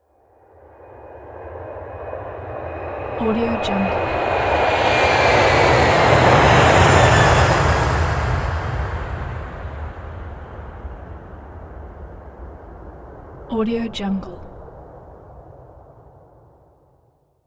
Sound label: airplane; pure-noise